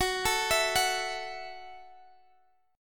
Gb Minor 7th Gb Guitar Open G

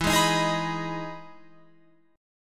E Major 7th E down Guitar Open F; EM7 strummed

